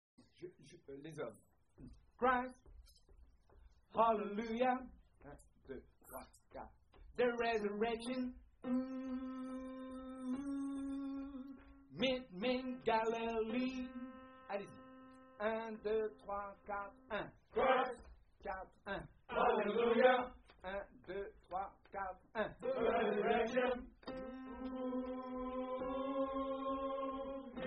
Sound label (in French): hommes